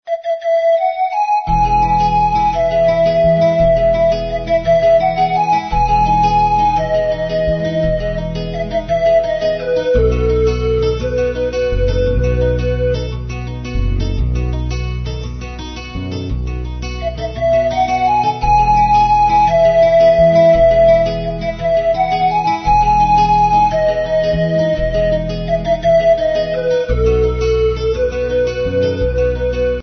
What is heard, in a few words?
melodies flute pan